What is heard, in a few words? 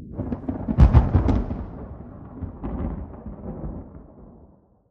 thunder, ambient weather; Minecraft; Votive World